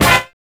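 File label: horn stab; X-Static Goldmine 1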